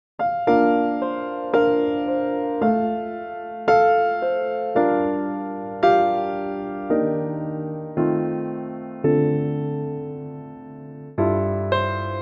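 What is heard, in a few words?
Bb Piano, male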